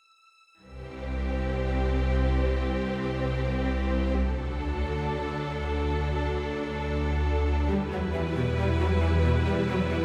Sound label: tension violin, horror excitement thriller song; By Burak Sound Bank